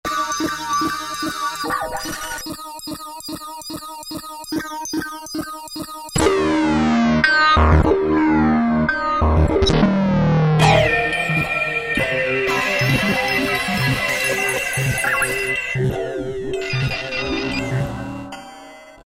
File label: Sfx Sonik Syn, E-mu Morpheus; Factory Presets